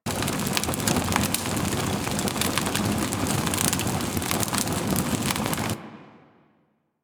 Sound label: Hub Fire Loop; Warframe; Tenno Hub